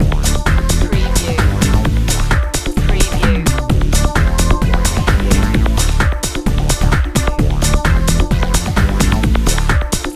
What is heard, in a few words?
power beat loop